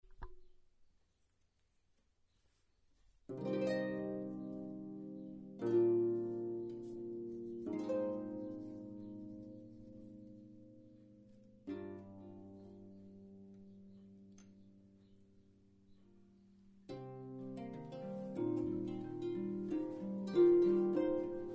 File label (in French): cordes Noyer, clarsach